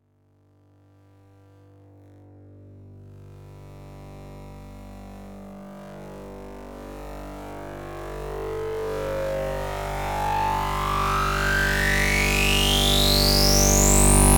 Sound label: uplifter